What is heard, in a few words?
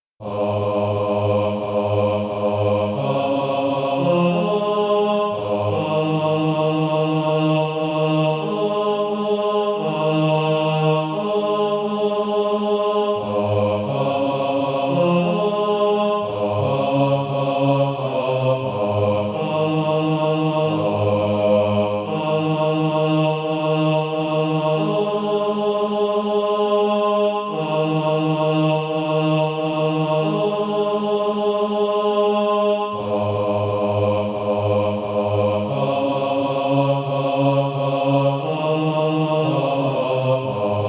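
bass, SATB Practise Music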